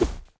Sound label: shoot snowball; Epic Toon FX